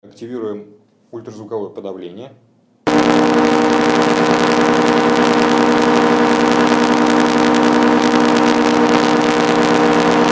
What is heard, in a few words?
noise rec